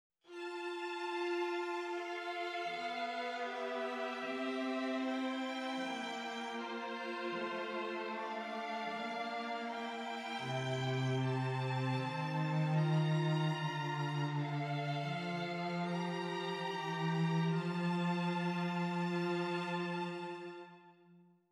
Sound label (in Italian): esercizi di contrappunto semplice A tre voci; 4 gattung; Ronge